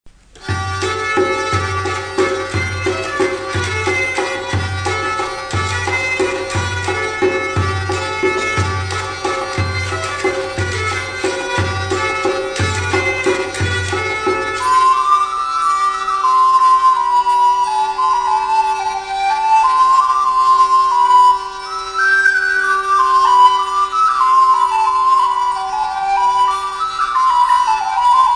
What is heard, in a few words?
Carmina Burana - Instrumental